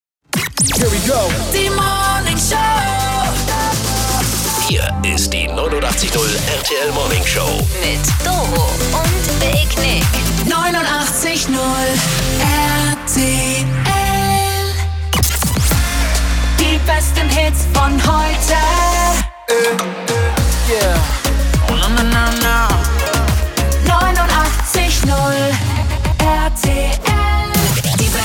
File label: Jingle Paket; 89.0 RTL